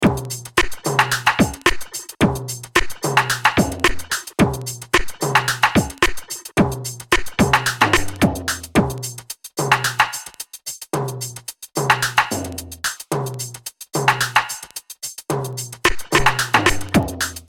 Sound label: Beats